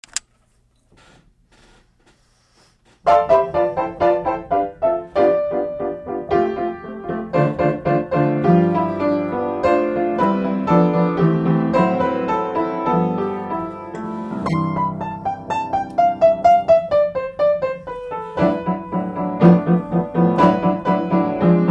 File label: piano